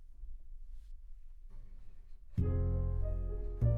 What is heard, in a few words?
CBass Bot